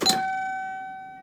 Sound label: tram ticket canceler; best service Blue Box